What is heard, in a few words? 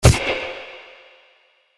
stab